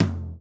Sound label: 2000s high floor tome drum sample f key, Royality free tome drum sample tuned to the F note